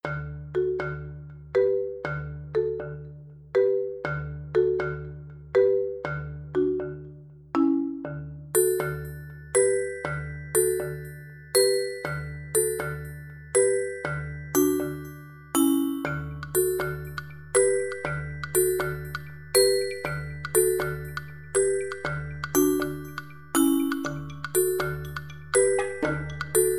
cuckoo clock no birds

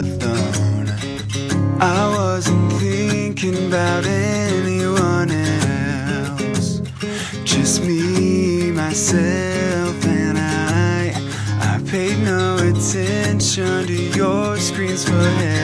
acoustic version

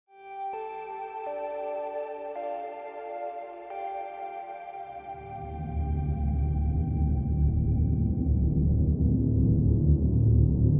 ambient

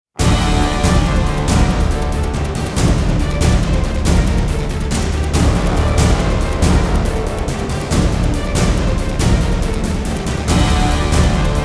no choir

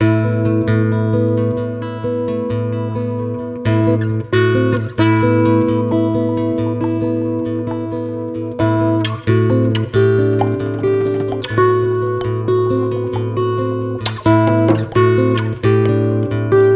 guitar instrumental

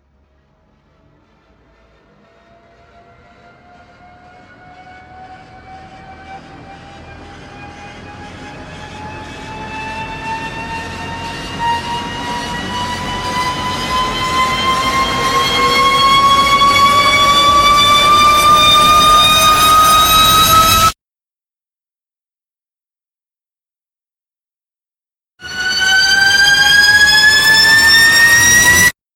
risers killing machine

